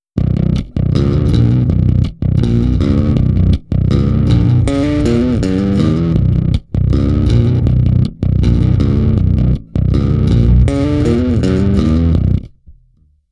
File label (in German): Hals Steg Overdrive